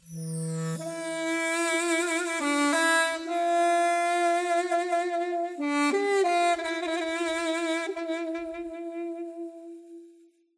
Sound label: Duduk; Temple Rnd; tintin reporter cigars of the pharaoh